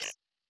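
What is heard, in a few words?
Title Button Cancel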